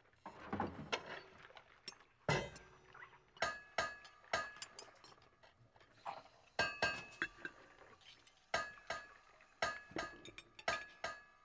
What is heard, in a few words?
Amb; miduo client